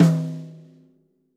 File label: rack tom - snares on; JazzFunkKit